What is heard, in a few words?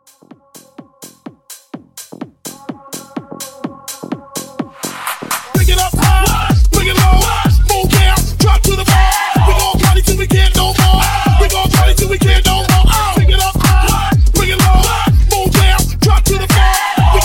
Hype 126